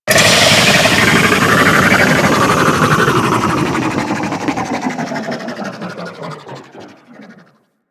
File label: Movement Wind Down Long; Warframe; Vehicles; Archwing Demolition